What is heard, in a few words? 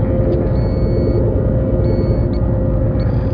tech amb; MOTS